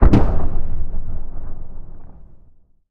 tank explosion far; War Thunder

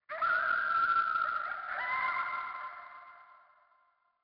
sting coyote; Rust

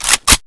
Inf Weapons Foley 3rd; Red Orchestra 41-45

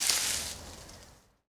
light bush impact

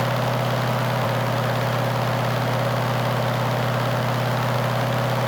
engines; World of Tanks

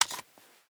m92 clip out; Rust

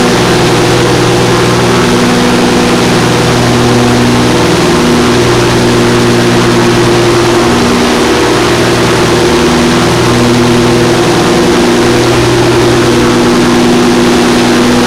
morava rear, engines; War Thunder

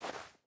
footstep burlap snow walk; Rust

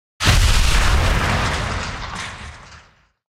wreck explosion; War Thunder

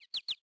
goldfinch; World of Tanks Blitz; hangar blitz

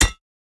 salvaged icepick strike soft; Rust

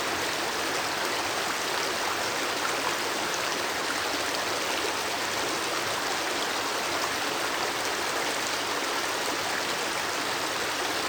ambient; World of Tanks

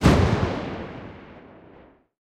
mg 8mm besa lastshot

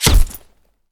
body armor close; Escape from Tarkov

